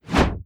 swoosh

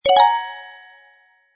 collect Paper

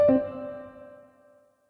wood chip fail fast

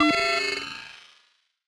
confirm or not slow

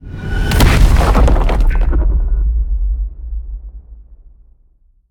colossal Explosion; asgardian stones

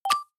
upgrade click; my Buildings; City Verse Tycoon

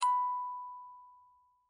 ding

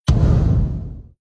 boom

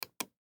click

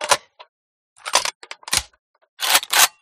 reload; usas; resurviv

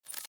ui short

